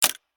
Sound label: Button click